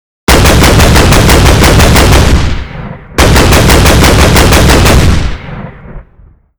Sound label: zpu fire, weapons; ModernWarfare